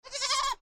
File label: goat bah